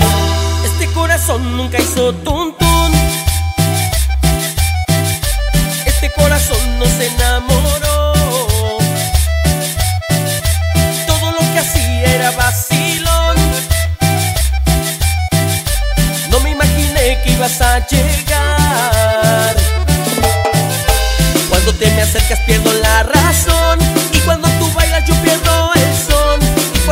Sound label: Corazon Tun Tun Mexican Ringtone